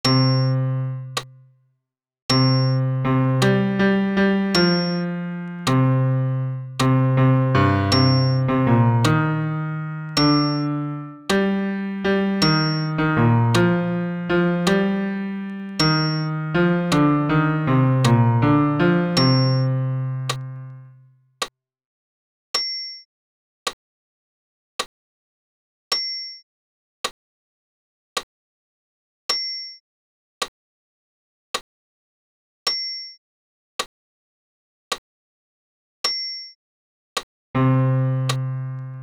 Credo bas pian seul

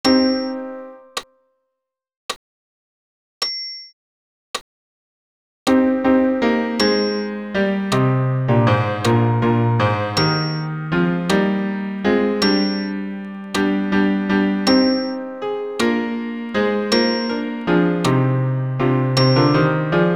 Credo bas pian acc

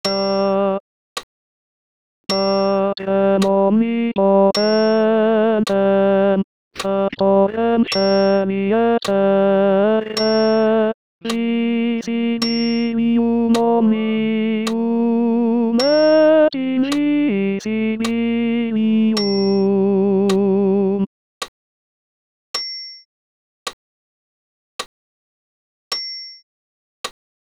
Credo ten voc seul

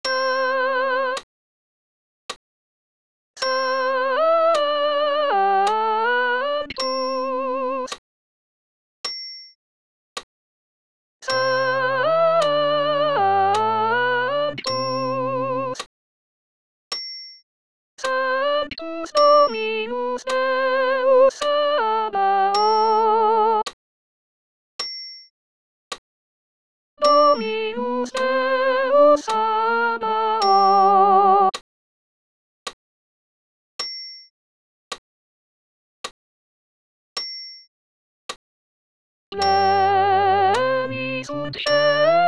Sanctus sop voc acc

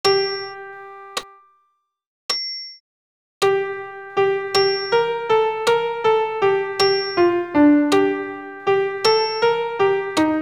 Credo alt pian seul

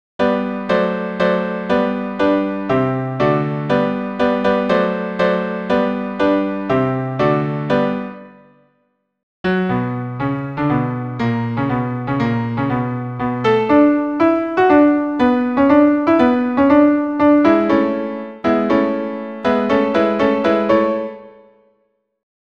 tous pian

